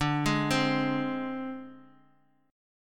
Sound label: Ab Major Flat 5th D